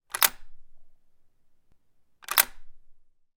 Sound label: weapon gun 1917; still north media